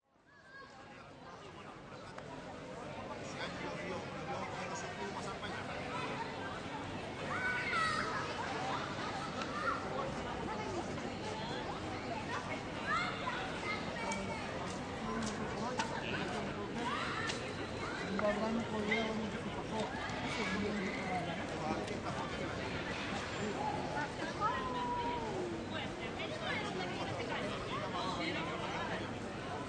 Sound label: Crowd Spanish Town Plaza, Pedestrian street in Spanish town.; People walking by in all directions, distant sound of children; Outdoor Sound Effects; Lynne Music